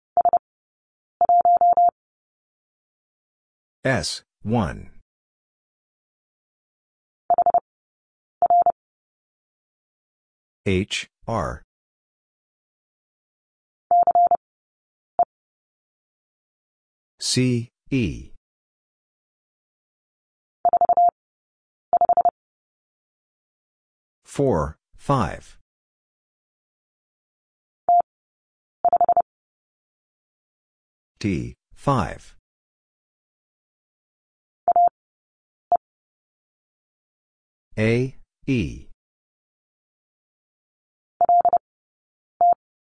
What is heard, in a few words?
Letter c Two Characters 30wpm; Lesson 16d